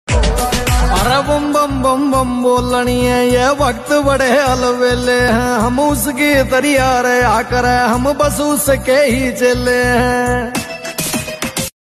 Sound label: attitude ringtone bgm; mahakal